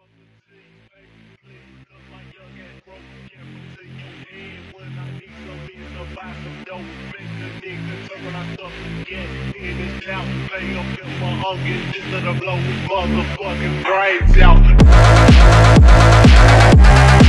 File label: phonk